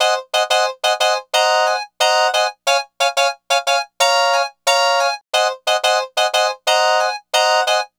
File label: Lounge Hrpsikrd-G#; Zero-G Kocktail Kollection; Construction Kitz (Lounge); Welk Lounge 090